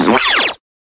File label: hit